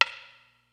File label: MEGA KIT, DRUMS; Kid Nepro; Elite